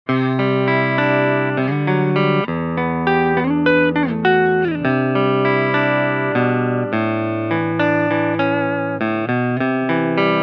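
Gibson Les Paul Junior Special Orange Terror Clean; Orange Jim Root Terror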